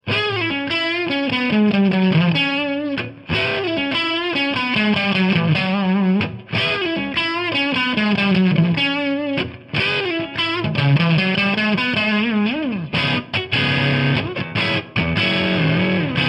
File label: C sharp minor riff amp